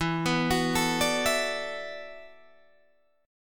E 9th Suspended 4th E down Guitar Open G

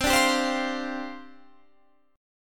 C Suspended 2nd Suspended 4th C; strummed; Csus2sus4; 20,17,17,17,18,20

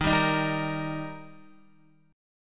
Eb Major Eb down Guitar 4F,4A,5C,5G,5C,6E; strummed; JGuitar

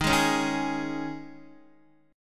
Eb 7th Suspended 4th, Guitar; Eb7sus4; strummed; JGuitar

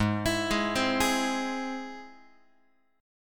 G sharp Augmented, Guitar; arpeggiated; x,4,7,6,4,7; 4A,4E,5A,5D,5G#,6C#; JGuitar

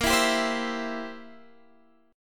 C 7th Bb

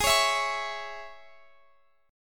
Ab Suspended 4th Sharp 5th, Guitar, Open F; Absus4#5; strummed; chordshape; x,x,20,20,19,20

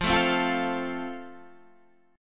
F Major Guitar Half Step Down

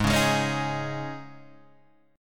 Eb 7th Suspended 4th

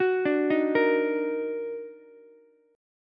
D sharp Minor Major 7th, Ukulele; arpeggiated

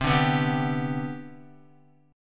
C Major 7th Suspended 2nd C down Guitar, chord; CM7sus2 strummed; JGuitar

